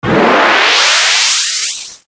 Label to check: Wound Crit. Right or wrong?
Wrong